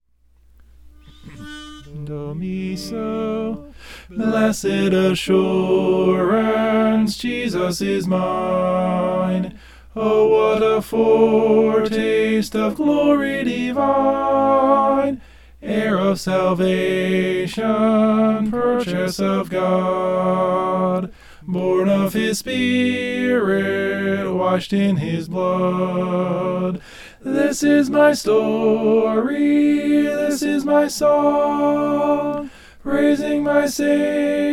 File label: tenor; blessed assurance